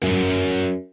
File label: electric guitar